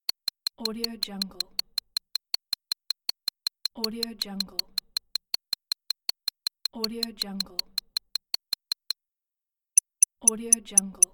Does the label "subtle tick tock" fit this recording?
Yes